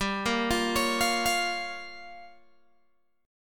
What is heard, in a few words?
G Minor 7th Flat 5th, Guitar, Open G